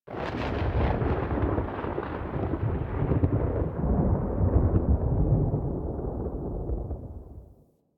Landscape Thunder Crack Low, Ambience; Warframe; Venus Open World; One Offs

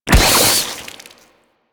Infested Face Hugger Death; Warframe

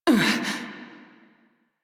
Pain, Female; Railjack Crew; New Loka

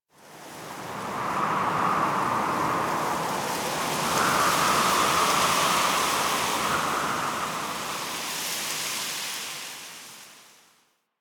Night Wind Gust Medium; Ambience; One Offs; Warframe; Eidolon Plains

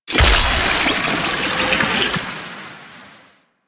Infested Fishing Spear Fish Caught; Warframe

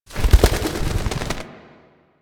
Bird Three Wing Flap; Warframe; Ambience; Entrati

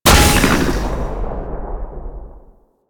Secondary Shot, Weapons Cannon; Kuva Zarr

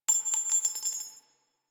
Sniper Shell Casing; DE; Warframe; Warframes; Frumentarius; ExaltedSniper

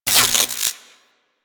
Mag Spark; Archwing Intro Cinematic

